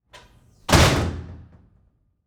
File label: Emergency Security Door Close; emergency effects; AdobeCC